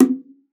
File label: Bongos; ProSamples vol.42 - Session Instruments